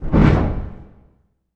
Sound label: cinematic impact wipe